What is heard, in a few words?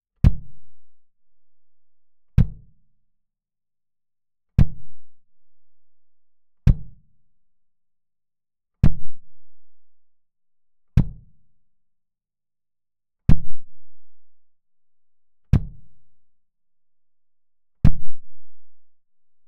Kick Closest Kick Pad